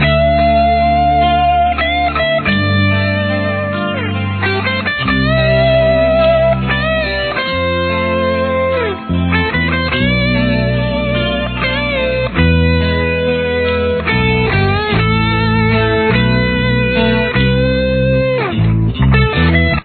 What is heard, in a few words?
solo